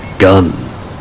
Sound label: gun